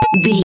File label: retro collect pickup item